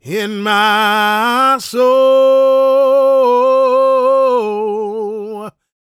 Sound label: GOSPEL MALE; Spectrasonics Vocal Planet